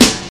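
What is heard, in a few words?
dirty snare drum b key, steel snare drum tuned to the B note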